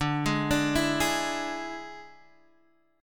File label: D Suspended 2nd Flat 5th D Guitar Standard; 10,11,12,9,9,x; arpeggiated